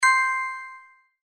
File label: win end